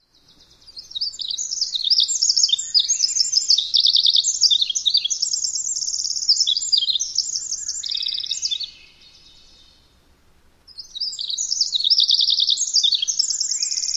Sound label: song western